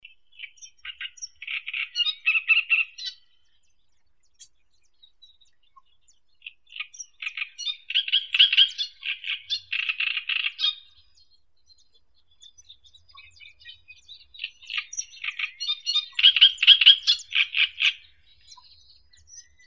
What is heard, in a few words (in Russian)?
kamishovka drozdovidnaya acrocephalus arundinaceus